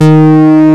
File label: D#3 SHRT, Moog Synths; E-MU Formula 4000 Series Vol. 3; Analog Odyssey